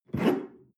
espresso grab tablecloth slide

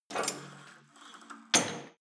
cable machine pull and drop full, Fitnessstudio; sport freizeit

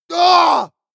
male combat hit hard

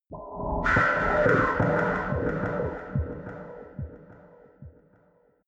dark stinger

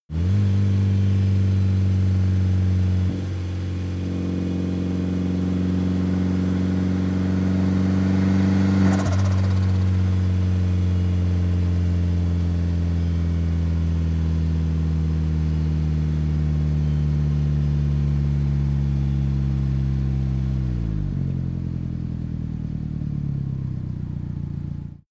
car donkervoort d8 gto engine brake high rpm

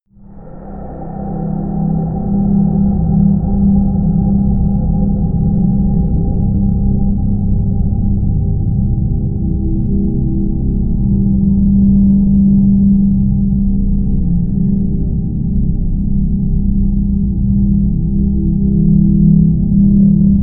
drone exhale

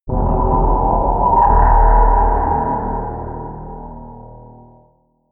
scrapes tensing scariness, drones

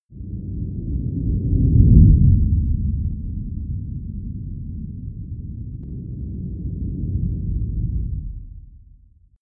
whoosh low slow; whooshes

